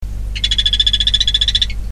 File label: Sound Phyllobates vittatus; Dendrobatidae